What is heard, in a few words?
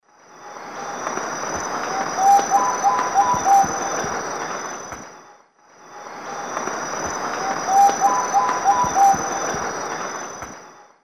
Mytmothera campanisona, song variant; S. America; Eastern Lowlands